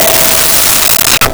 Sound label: Harp E5; Nintendo; July 2020 Gigaleak